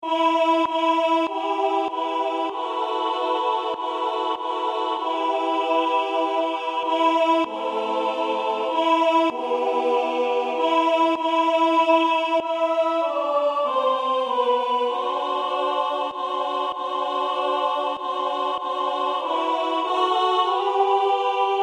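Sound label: female; Plotiyu usnuv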